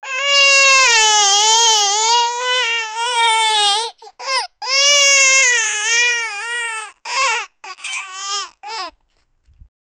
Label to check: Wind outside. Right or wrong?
Wrong